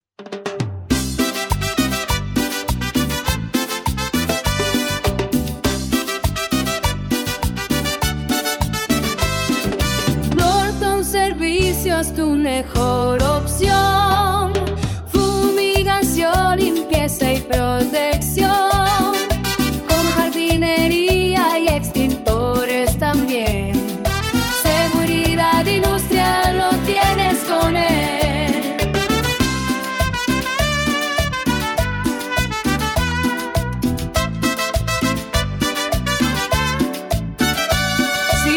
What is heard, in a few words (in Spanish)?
jingle